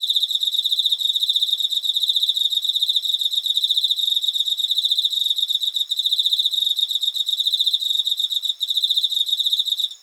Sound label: emmakoorogi 25 degree, songs of insects